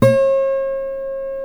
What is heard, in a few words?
D 5A MARTN 6, Guitars; Spectrasonics; Hans Zimmer Guitars VOL-1; MARTIN 6